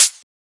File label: Closed Hat; FLPRO